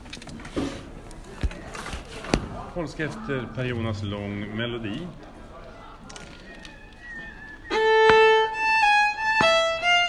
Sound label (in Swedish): Melodi Polska; Efter Per Jonas